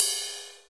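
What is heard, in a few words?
DIRT RIDE BELL, CYMBALS; Drums In Your FACE!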